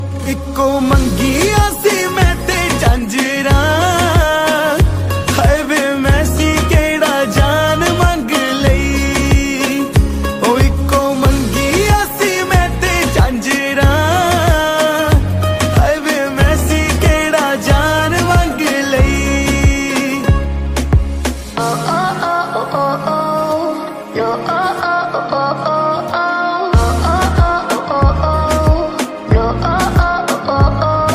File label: Latest Punjabi New Ringtone